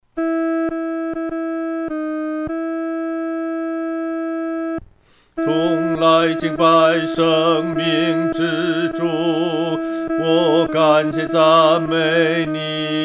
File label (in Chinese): 独唱 第二声; 领我到各各他